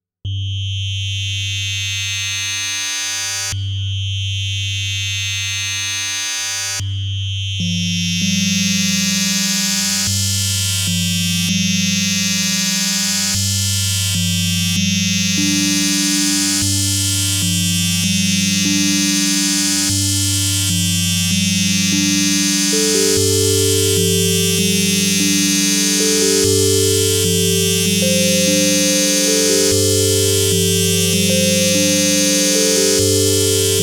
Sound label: KORG Node; Fiberglass